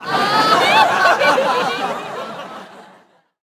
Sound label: crowd laugh